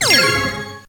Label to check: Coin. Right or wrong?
Right